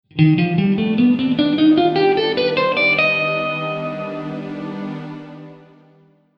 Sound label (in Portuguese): Escala de Eb Lidio 5